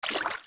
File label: Steps Shallow Water Land; Zelda; OOT